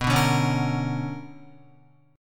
B Minor Major 9th, Guitar; BmM9 strummed; JGuitar

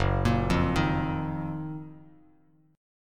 F sharp Major 13th Bass Standard; arpeggiated; JGuitar

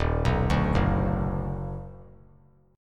Eb Minor Major 7th Sharp 5th Eb down, chord; EbmM7#5; arpeggiated; JGuitar

